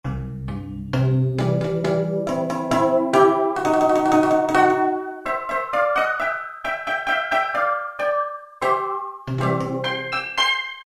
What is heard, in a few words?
Jamaican Sounds; Roland PN-D50-04